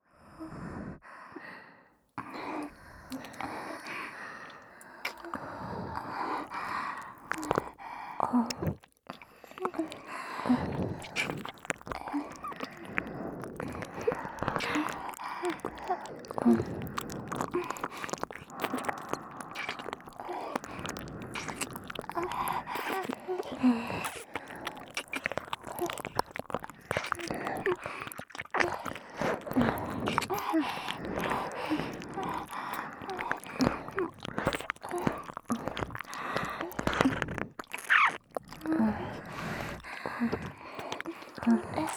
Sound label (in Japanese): キス：両耳舐め